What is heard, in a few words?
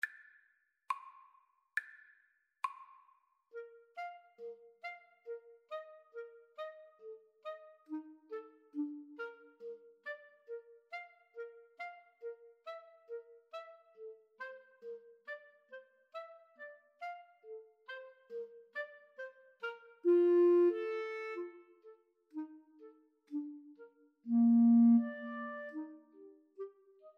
Clarinet Trio